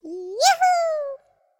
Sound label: Boot sound; Shiny the Firefly; android ios linux macos mobile wii u windows